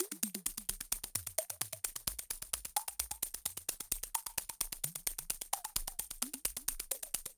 SHAKER, Ethno Techno; ILIO - Ethno Techno

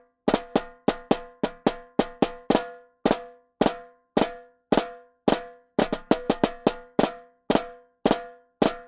Jungle Snare, African; Sonic Foundry (Sony Creative Software); Continental Drift Aftershocks

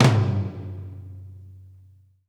FLAM RACK, TOMS; Sampleheads; New York City Drumworks VOL-1; SP TOMS

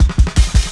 Shuffling Live Cut, Jungle Drum Loops; Zero-G Jungle Warfare 1, 2 & 3; 90 s Sample CDs

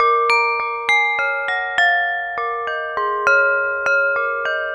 Corning Bowl; Ambient; Melodic; Ambient-Melodics

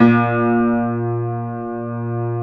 A#1 R, Keyboards Acoustic; USB Soundscan; AKAI

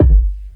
kick broke

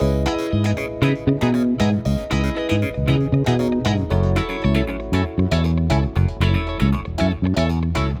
Backing, Nu Disco, Cm 117bpm; Zero-G; Construction Kits; At The Mansion

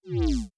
sine wobble, bass

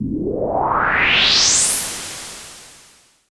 reverse; Techno Trance Essentials; FX & ATMO

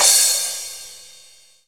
CRASH CYMBAL; Greytsounds; Scott Peers Sound Engineering

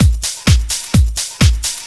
Discohouse Loop 128 BPM

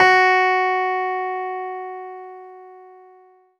CLAVI, Disco House; Best Service Dance Mega Disco House; CLAVINET

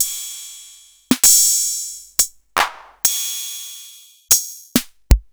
Boss Dr Rhythm110

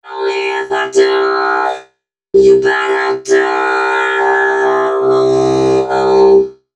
female c# 138, hooklines 136-140 BPM; Mutekki Media; Dance Ultra Voice VOL-1

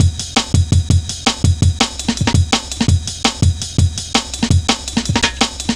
jazz ridez, drum loops

